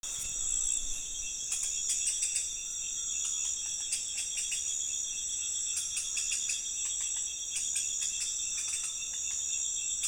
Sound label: Funisciurus cf lemniscatus, Cameroon; Squirrels of Western Africa